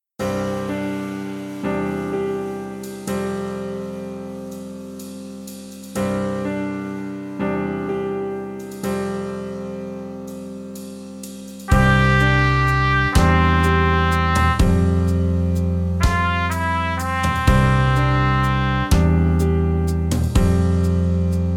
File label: Trumpet; easy beat vol 1 trompete